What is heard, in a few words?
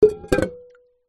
household water in large metal pot down on wood; zapsplat